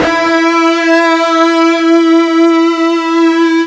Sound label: FUZZ E 3, Guitar; East West; Steve Stevens Guitar Samples Collection